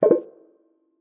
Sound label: Menu Select; Martian Chess Audio